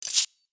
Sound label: open menu